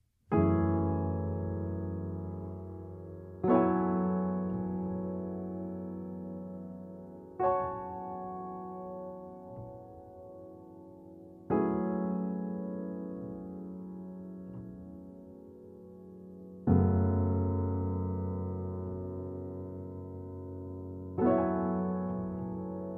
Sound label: F sharp min7